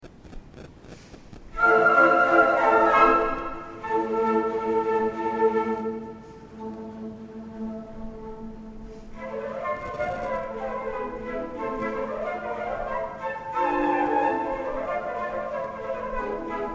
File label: consert, flute; arietta-flute